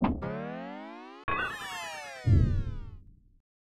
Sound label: Bouncing Scale; Motoko-chan no Wonder Kitchen; snes